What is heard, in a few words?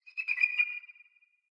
land bird; smite; chaac